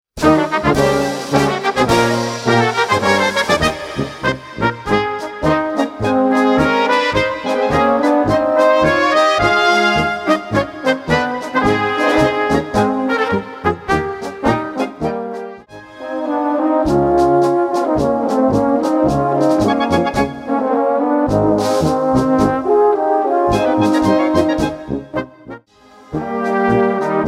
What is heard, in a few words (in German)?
Blasmusik